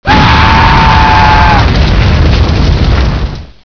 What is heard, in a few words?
flames scream